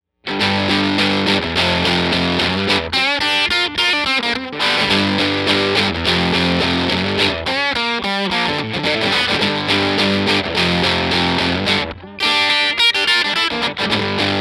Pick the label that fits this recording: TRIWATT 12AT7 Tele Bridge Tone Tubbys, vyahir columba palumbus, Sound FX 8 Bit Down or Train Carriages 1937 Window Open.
TRIWATT 12AT7 Tele Bridge Tone Tubbys